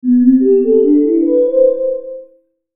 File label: Logo Soft Synth Ascend; Logo Soft Synth Melody Ascend; Sonic Logo Sound Effects; Blastwave